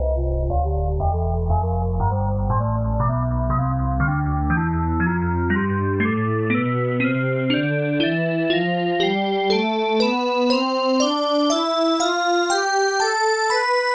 bowed glass tonleiter

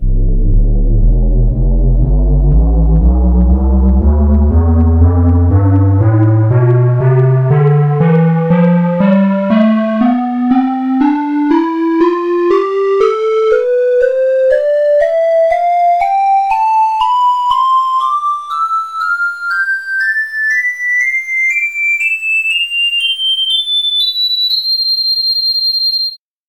ocarina tonleiter samples

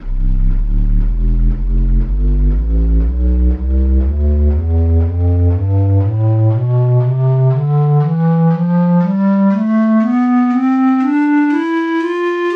pan flute tonleiter

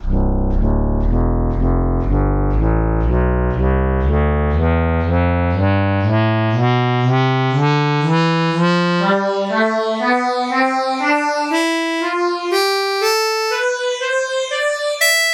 bandoneon tonleiter

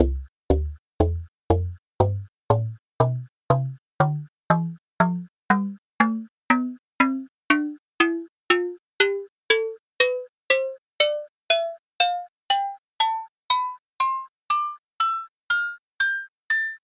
agogo tonleiter

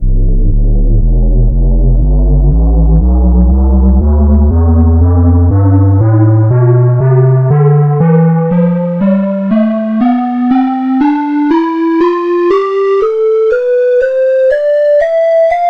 ocarina tonleiter

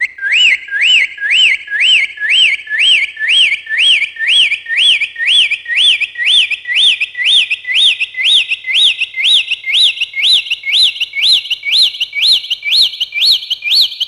bird tweet tonleiter

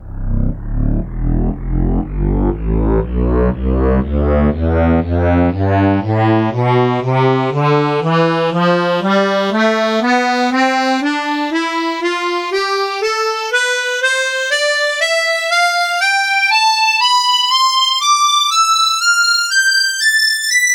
bandoneon tonleiter

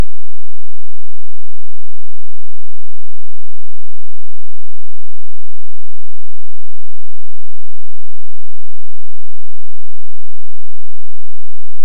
bass lead tonleiter

